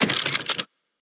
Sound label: Weapon Reload